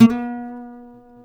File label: C, Martin, guitars; Spectrasonics; Hans Zimmer Guitars VOL-1; MARTIN HAMMR